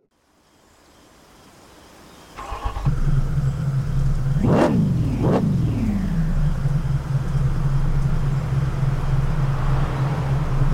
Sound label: audi a6 4.2 quattro